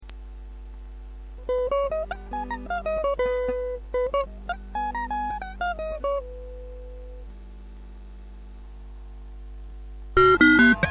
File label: maestro ring mod